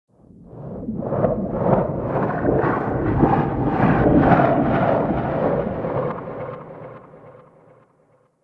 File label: Ext Rumble; Ambience; RedNight